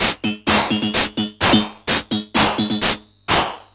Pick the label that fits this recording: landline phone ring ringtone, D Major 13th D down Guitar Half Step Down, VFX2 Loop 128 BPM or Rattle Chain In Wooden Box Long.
VFX2 Loop 128 BPM